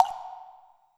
Water Drop

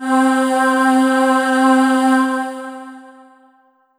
Roland JV 2080 Choir Aahs C4

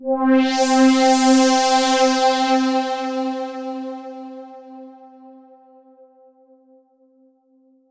Yamaha AN1x Resonant Pad C6

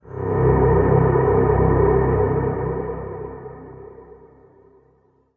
Ominous Echo C3

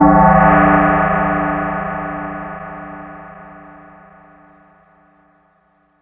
Casio VZ-10M Gong C2

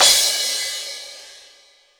Crash Cymbal

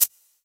Korg N1R Shaker

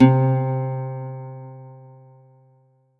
Ensoniq ZR-76 Lute C3

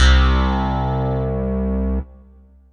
Yamaha EX5 Gronk C2

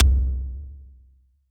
Boom Kick

